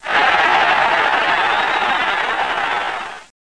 C CHEER; Interactive Manchester United (2000)(Alternative Software)

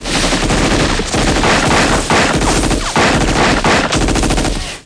soft

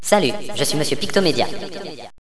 kit; Villagina; ecole

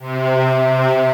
slow strings C2; Star Trek JR Audio

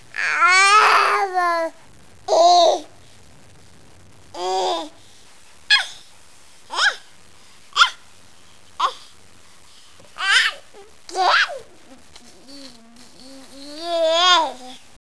baby

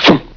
cartoon

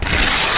tear; Cool Address 1.5

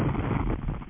explosion; SCI-FI Sensation v2.2; starwarsii

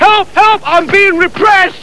panic; Hardercore Sounds

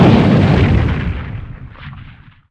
explosion; WarZone 2100

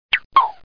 pick

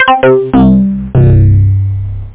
SynthBass

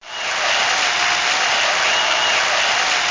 applause

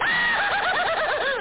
ahoohoo; World of Amiga

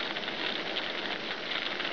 fire; machines

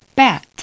Bat

